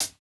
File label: hihat, house